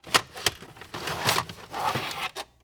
Technology Electronic VHS Pull Out Of Cabinet

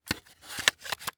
Electronic Cassette Case Replace Cassette

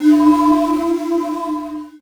Title Transition Bell; Adobe; Production Element

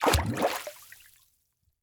Liquid Water Water Hands Forced In Water

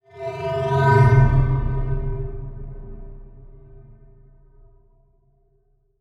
Transition Sweep Drone; Production Element; Title Transition; AdobeCC

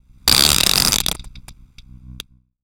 Transition Distortion Electronic; Production Element Title Transition

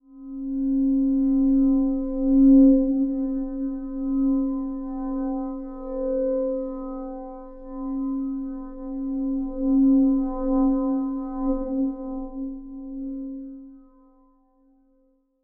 Transition Stab Dark Bell; Production Element

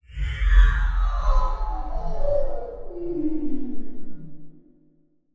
Title Transition Power Down; Production Element

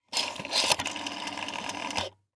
Household Phone Dial Rotary Zero

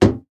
Impact Hand Hit Car; Impacts

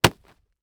Impact Body Fall On Concrete; Impacts

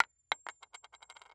Coin Drop On Wood Platform; Foley

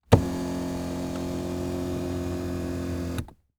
Transportation Jeep Commander Adjust Seat

